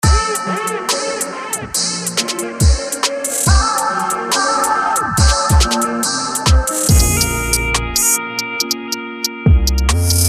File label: Trap and Drill Medley; Native Instruments; Maschine 3 v3.0.0; maschine expansions